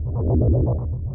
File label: idle, weapons plasma; lmctf